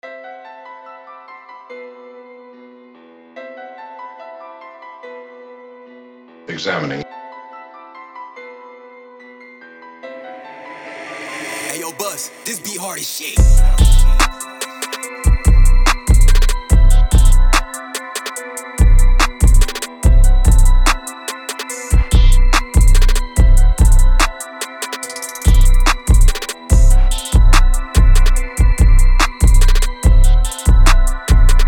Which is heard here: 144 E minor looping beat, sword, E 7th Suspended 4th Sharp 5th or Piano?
144 E minor looping beat